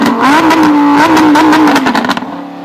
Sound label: Huracan Rev